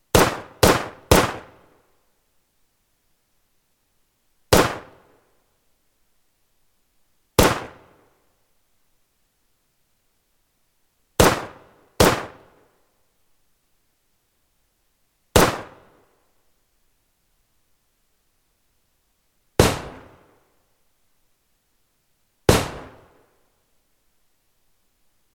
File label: Mauser c96 Dry Samples